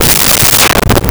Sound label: Dull Wood Hit Bounce; Vintage Cartoon; Drums & Percussion